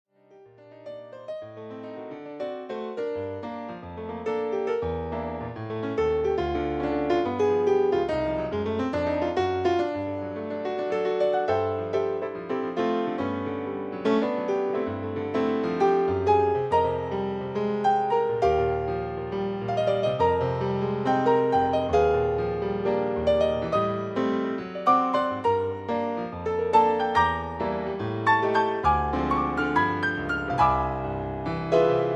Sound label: Piano